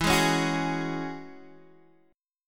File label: E 6th Add 9th E down Guitar DADGAD